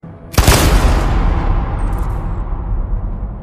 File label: alert